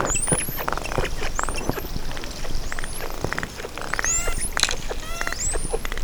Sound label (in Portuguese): Gravação Assobios e Sons pulsados Açores